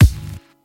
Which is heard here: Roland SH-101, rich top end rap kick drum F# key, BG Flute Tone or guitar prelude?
rich top end rap kick drum F# key